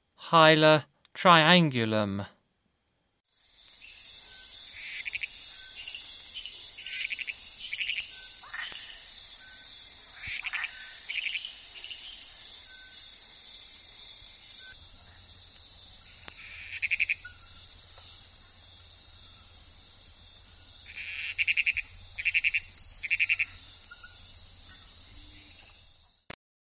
Hyla triangulum, Frogs of Ecuadorian Amazon; S. America

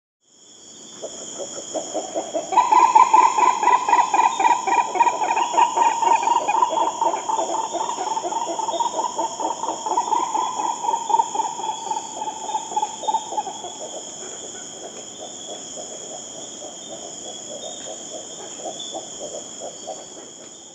Green Ibis (Mesembrinibis cayennensis), Venezuela; S. America

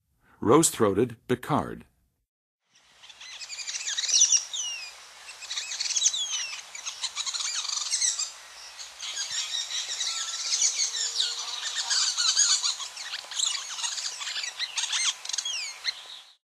Red-throated Becard; North America; Western USA; Western USA Songbirds

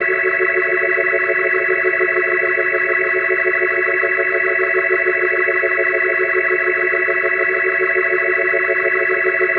Organ F# Min7, Organ Licks; Ambient Cinematic

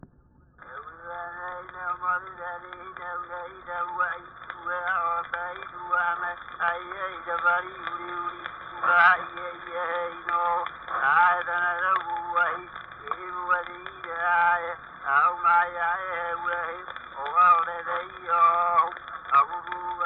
Name chant for the goddess, Hawai'i; World Music; Hawaiian; Hi'iaka; Na Leo Hawai'i Kahiko - Voices of Old Hawai'i

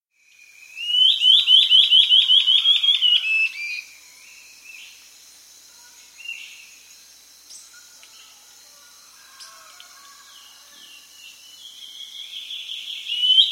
Rufous-Throated Antbird; S. America

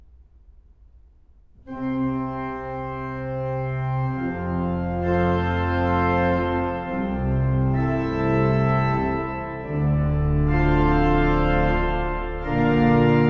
Requiem for organ; Franz Liszt; Sanctus

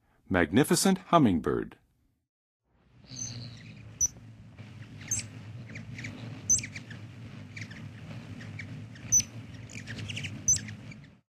Magnificent Hummingbird, Songs of Birds; North America; Western USA; Western USA Songbirds

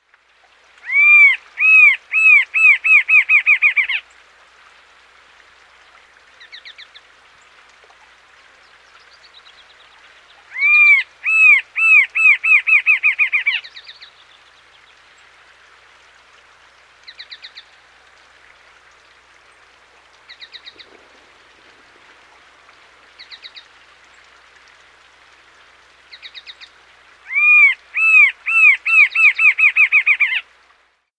Rufous-Capped Antshrike; S. America

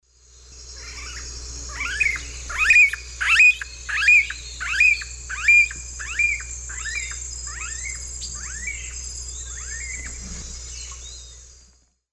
Crotophaga ani, flight calls; S. America; Eastern Lowlands

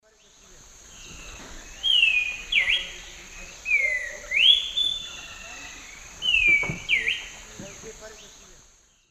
Schiffornis major, song; S. America; Eastern Lowlands

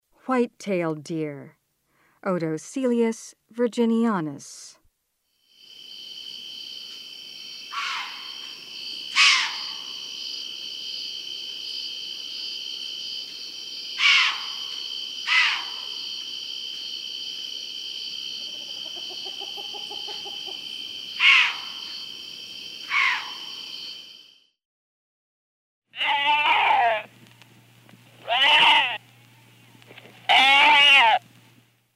White-tailed deer; S. America

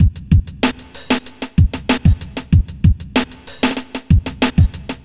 kit; Sound Effect Library

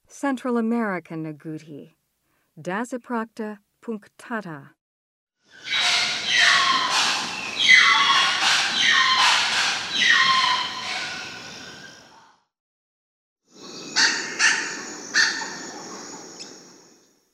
Central American Agouti; S. America

